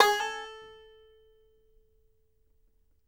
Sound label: banjo notes A 4; Country; Sampleheads - Whole Lotta Country 1 & 2